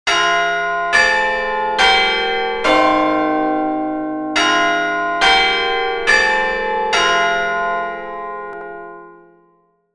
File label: chime, clock; Elks 11th Hour Clock; Elks Lodge; ModularSynthesis